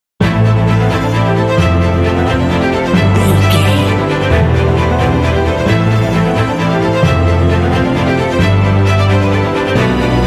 transcend sting

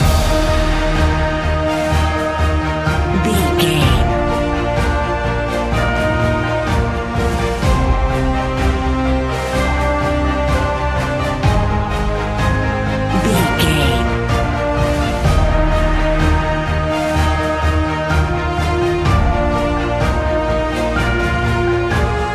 cinematic corporate loop

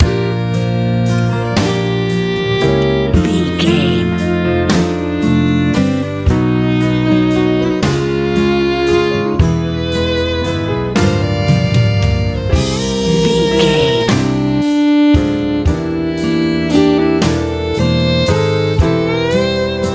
easy pop

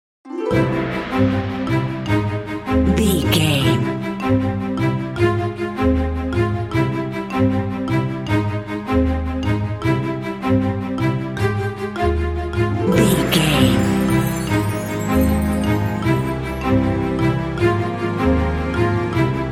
bright bouncy happy classical

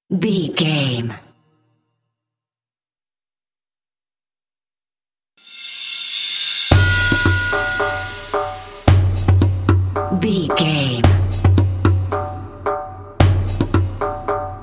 violin tension dance percussion only

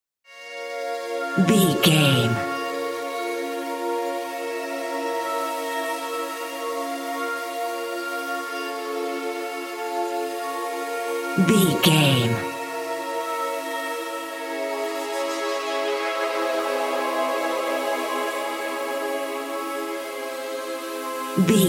mysterious video game expedition

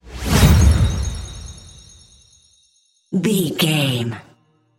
magic break

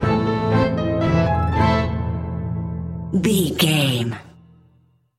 haunted carousel sting

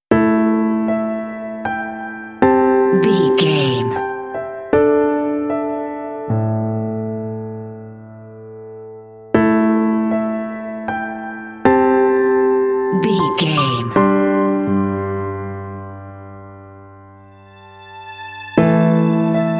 unsettled piano film score

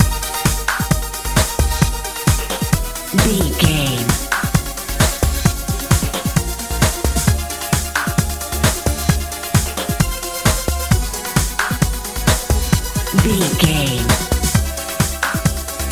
modern pop

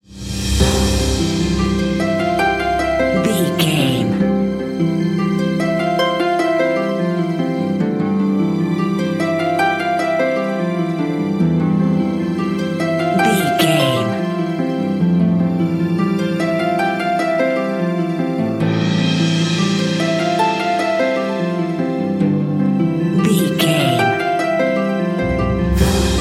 beautiful village

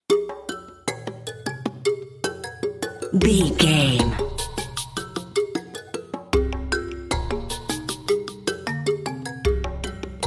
tribal expedition narrative

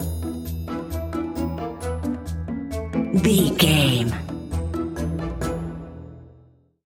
toys are alive stinger

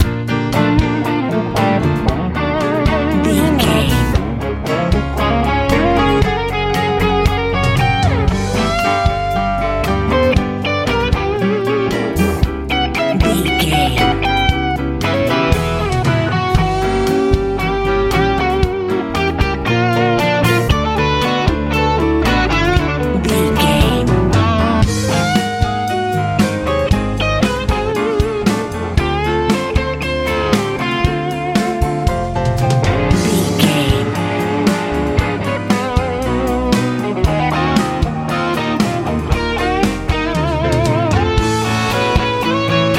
crazy fast colorful easy rock

